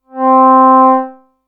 Vocalist Simple

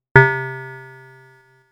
Bell, synthlead

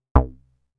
Short, synthlead

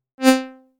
Violin, synthlead

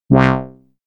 Multiwave Lead, synthlead

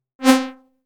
Wide C, synthlead

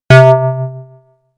Bell A; instruments

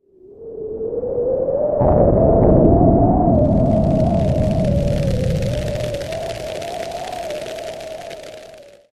Bad weather

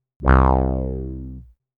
Wah A, synthlead

